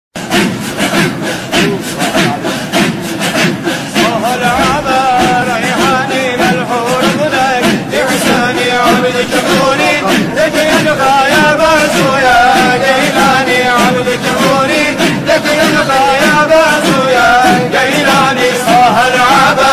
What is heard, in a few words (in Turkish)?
Arapca zikirli ilahi